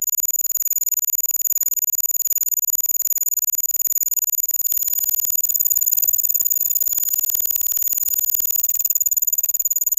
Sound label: reeling fishing rod loopi, fishing